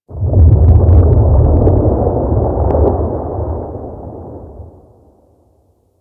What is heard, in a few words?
Ice Distant Ice Break Large; Ambience; Warframe; Corpus Ice Planet